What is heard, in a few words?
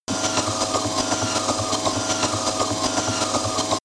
sci-fi machinery